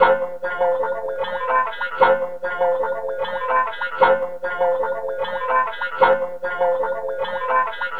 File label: Wet Guitar, Strings; Sonic Foundry (Sony Creative Software); Myths of Technology